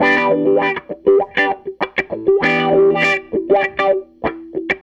SG Guitar Loop

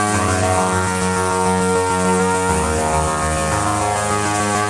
Seq Steam, Drum & Bass; Sonic Foundry (Sony Creative Software); Knutrix Drum & Bass

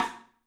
MARFSN, 3D Drums, X STICK; 3D Sound

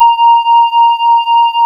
Synclav FM, WIND, A#; Big Fish Audio

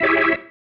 Stab, Organ, One Shots

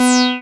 SYN TBM C5, MC202

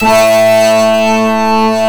SWEEPS; NorthStar - The Wizard VOL-2; TURBOTRONIX

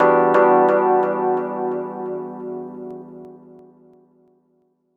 D&B Keys; neuro

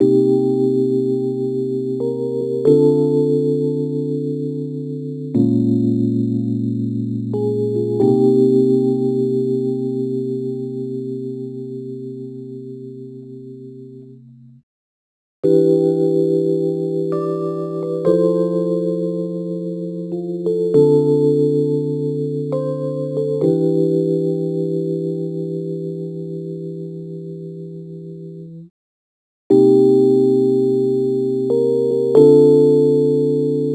Rhodes Attacks; Ueberschall

